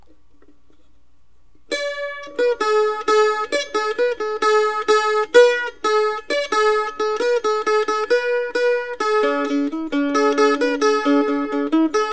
mandolin music

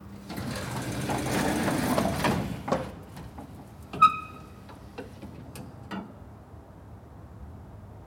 elevator door

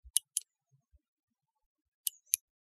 dipswitch ON OFF

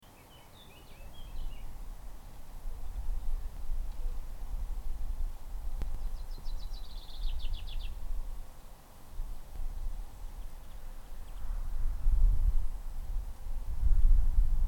wind and birds singing

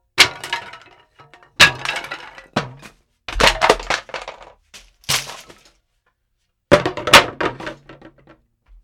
dropping breaking

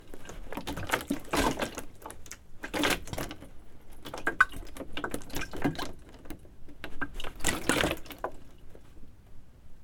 sloshing jug of water

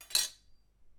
laying down a metal thing

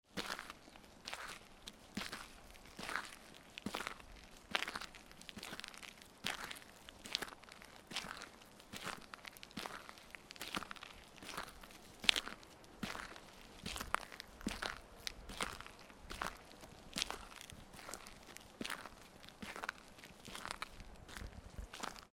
Forest Track

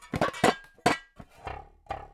LOOP Perc Mono

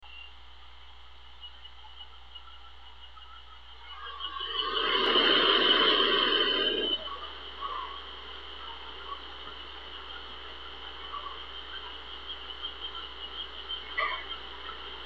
Country night noise

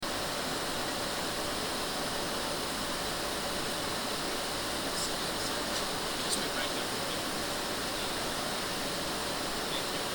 sound in airplane

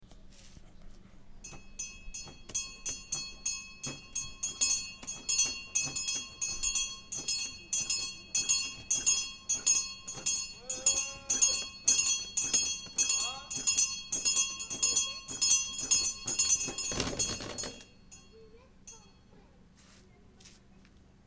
schoolbell

